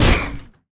Mech damgup, monster; steamer crazy

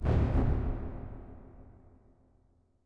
corridor, undeadtower

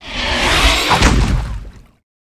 leviathan, monster

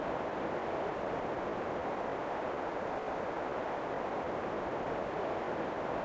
cemetery, desert

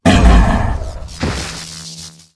zombie dead

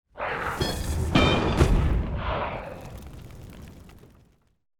darkspirit death, monster

